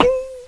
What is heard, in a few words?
foot